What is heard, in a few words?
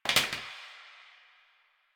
Plastic Broom Drop; Warframe; Nineteen Ninety Nine